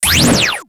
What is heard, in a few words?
Fire; Warframe; Weapons